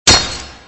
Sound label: Blade Impact; Extra Lives